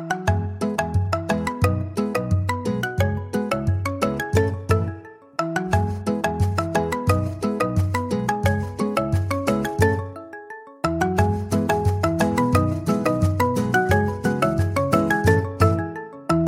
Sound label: Xiaomi Poco Ringtone